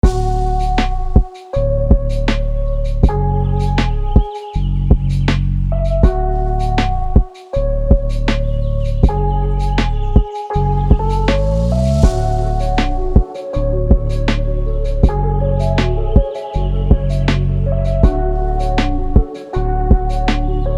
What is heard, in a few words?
lofi; arcade; coffee